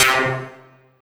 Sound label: SYN, 160 BPM, Drum & Bass; Ueberschall; Drum & Bass Flash Trackz